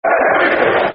We Hate Wigan, Football Chants (Soccer Songs); Bolton Wanderers Bwfc

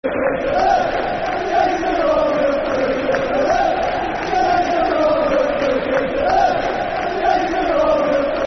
Football Chants (Soccer Songs); Jason Roberts; Blackburn Rovers Brfc

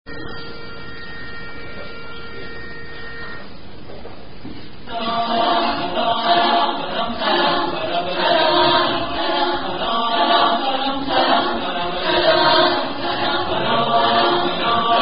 Drinking Song; The Engineers' Drinking Song

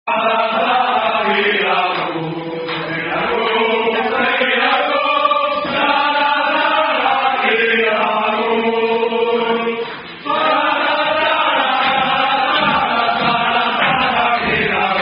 French Rugby Song; Les Douches; Sing For Rugby

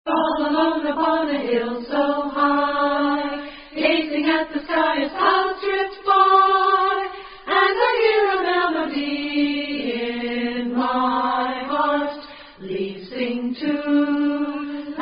Magic Melody, still singing girl scout; girl scout councils; mid atlantic

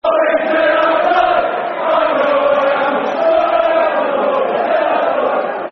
Derby Till I Die Football Chants; Derby County Dcfc

